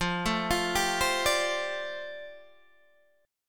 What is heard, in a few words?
F 6th Add 9th F down Guitar DADGAD, chord